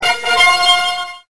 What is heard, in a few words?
FANFARE